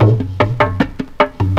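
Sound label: DHOLAK RHYTHM; Greytsounds; INDIAN PERC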